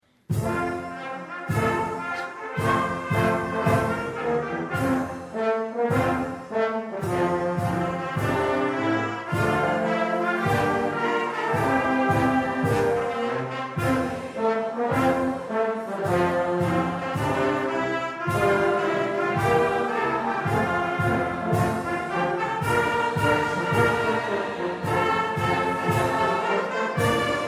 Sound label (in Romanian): Fanfara